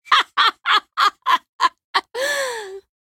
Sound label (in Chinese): laugh; 暗黑元首